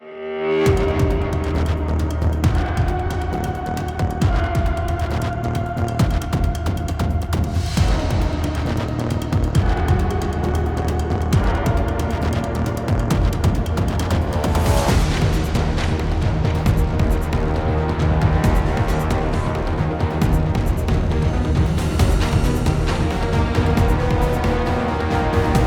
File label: Login Music; Login Screen; Corpus Railjack